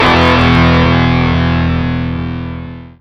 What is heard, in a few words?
powerChord; Systematic Immunity